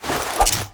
baton equip

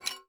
ui pickup metal medium rattley; Rust

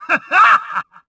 hah hah

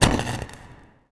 discharge

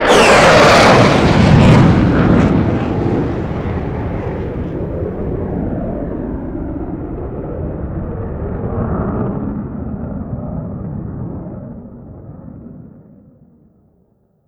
vehicle mig29 flyby long

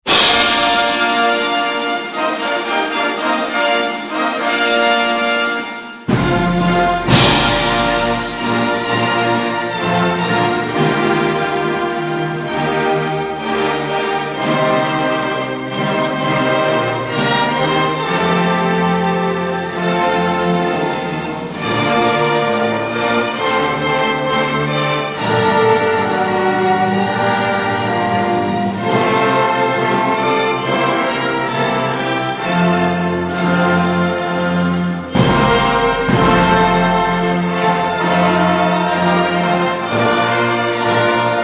Music; DCS

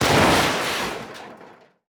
plane; World of Tanks

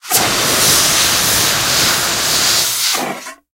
extinguisher; tanks; War Thunder

